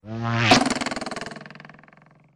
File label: clown dart; Crash Bang Boom